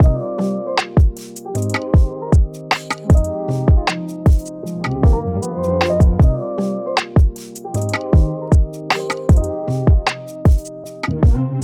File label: Cool RnB